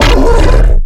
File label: giant idle; mutants; STALKER; customnpcs